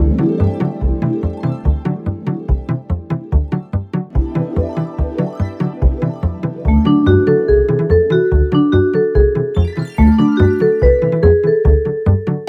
Menu; Garfield Kart Furious Racing

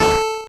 core squish A2; Earthnight

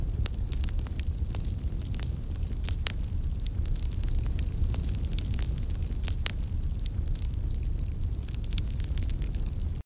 Fire Idle Loop; shieldwall chronicles swords of the north

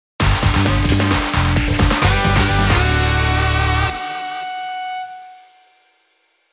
Mission Start; Advance Wars 1+2 Re-Boot Camp

